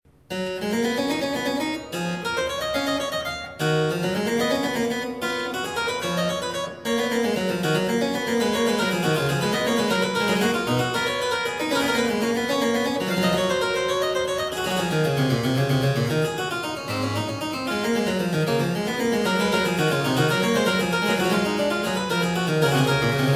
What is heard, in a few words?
Goldberg Variations, BWV 988 Variatio 27. Canone alla Nona. a 2 Clav; J.S.Bach